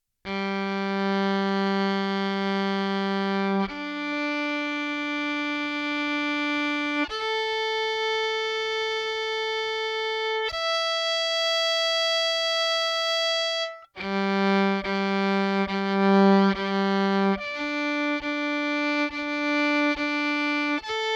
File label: pickup direct